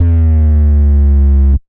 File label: Bass, Synth Bass; Shipwreck Bass; Ableton; Factory Packs; Beat Tools